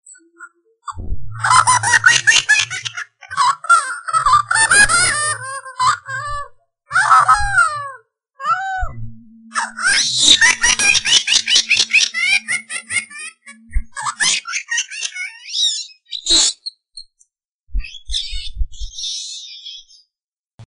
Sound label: sound baby laughter